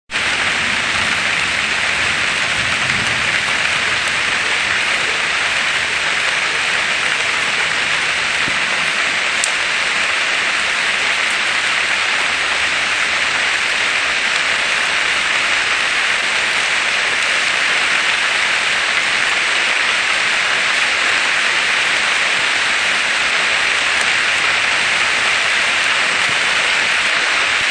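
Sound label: heavy rain; there's something different about the sound of rain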